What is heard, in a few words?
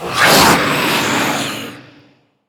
sporeplant scream